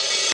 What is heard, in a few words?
studio open hi hat sound c sharp key, Royality free open hi hat sample tuned to the C# note.